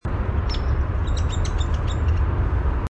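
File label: Hairy Woodpecker peek wicka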